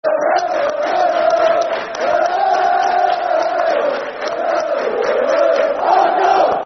Der Der Argyle, Football Chants; Plymouth Argyle Pafc

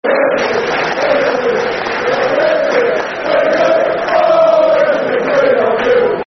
We Love You Ipswich, Football Chants; fanchants; Ipswich Town Itfc

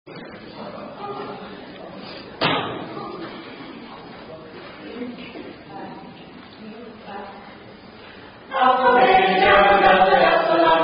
Greek Alphabet

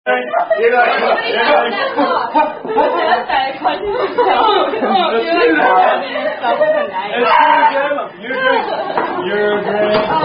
Signs Indian Drinking Game; Thumper

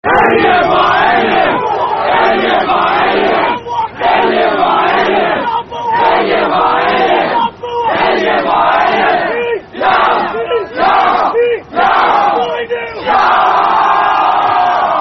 Rugby Chant; Kwai Nyu

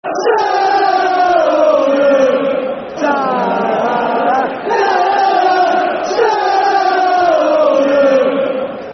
Football Chant, Soccer Songs; Nah Nah Nah Oldham; Oldham Athletic Oafc

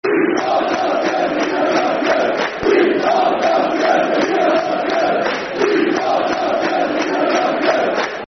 We Are Nottingham Football Chant; Nottingham Forest Nffc

